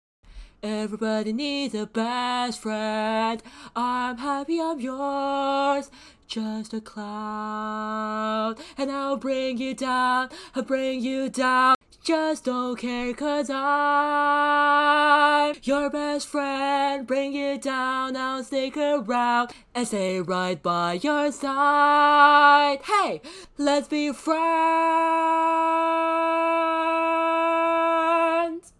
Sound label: Bari, Barbershop